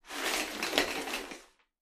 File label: shower curtain; curtains